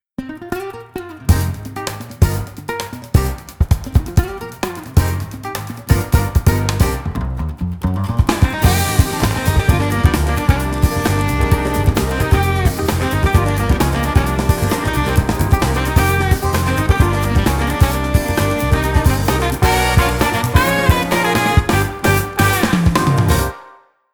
City Groove Tiny Verb; Stereo chorus effect plugin

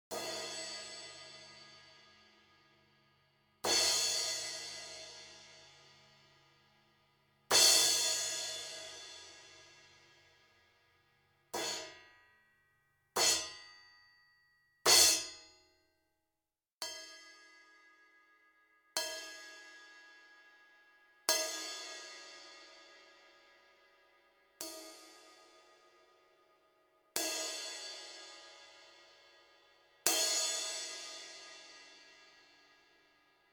Crash Cymbal 16 - Hickory Sticks

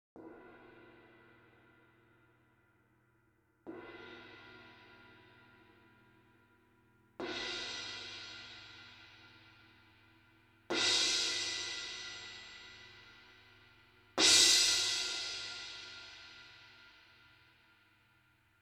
Crash Cymbal 19 - Felt Mallet

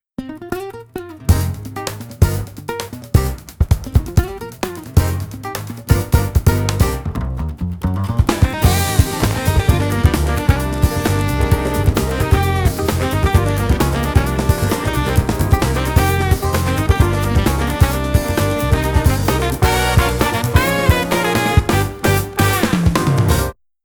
City Groove; Stereo chorus effect plugin

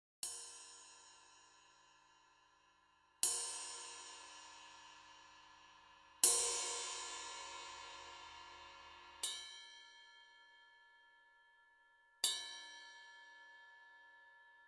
Ride Cymbal 20 - Hickory Sticks

